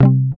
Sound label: GUI C, Acoustic, Roland